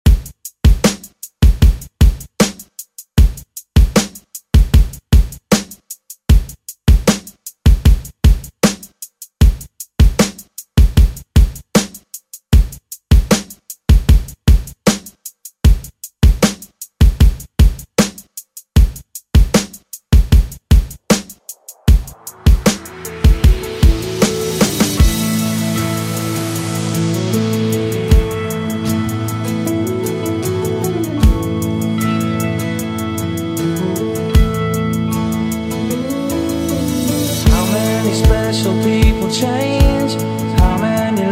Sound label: Rock Redrum; Deejay Hit